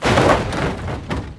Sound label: destroy debris; WAR